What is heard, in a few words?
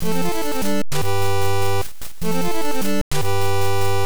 Mini Game Clear; Famicom Jump Hero Retsuden; Family Computer